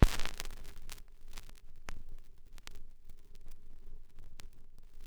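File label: vinyl, click crackle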